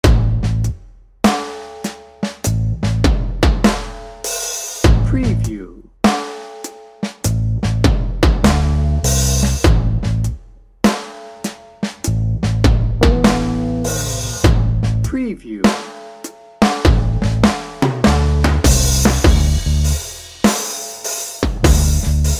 blue collar blues

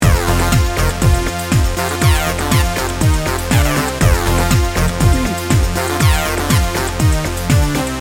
dance party loop

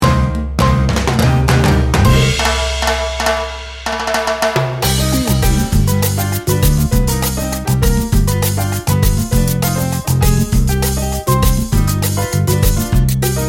chips and salsa no horns

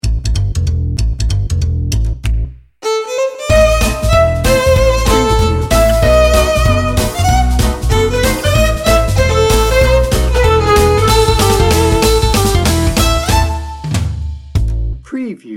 gypsy fiddle jazz

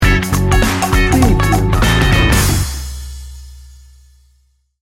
80s chill sting bumper

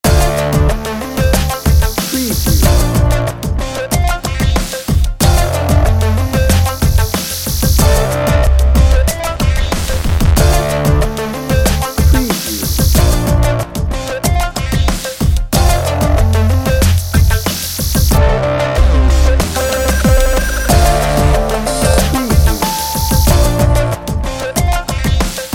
funky chill hip hop bed

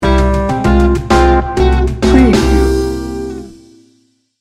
two for cocktails sting bumper

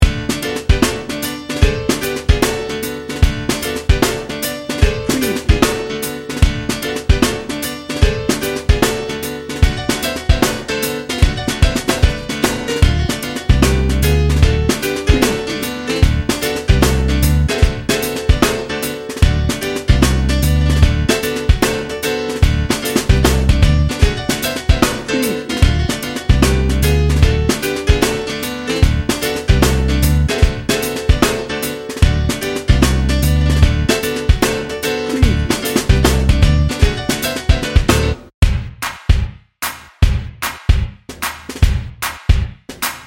new orleans strut no sax

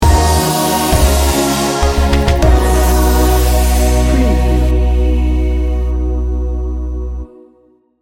seductive sensations sting bumper

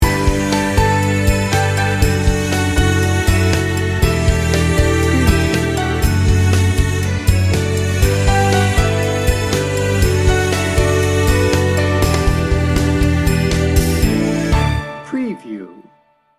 smooth and inspiring

